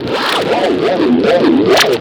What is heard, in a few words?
plastic; OSWorkshop